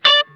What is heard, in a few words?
BLUE, Funk Guitar; Zero G - Funk Guitar